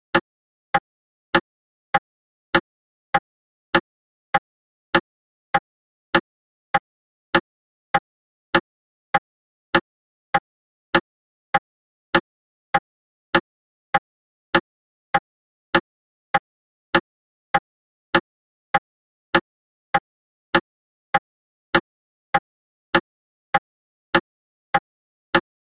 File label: Mantle Clock Ticks; mantel